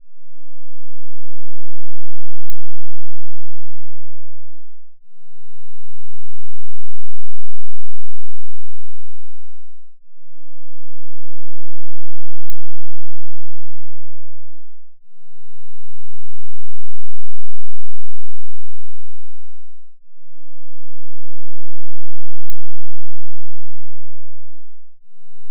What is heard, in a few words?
sine